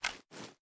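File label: assault rifle reload drum fast grab; viper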